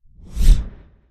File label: Small Short Deep Whoosh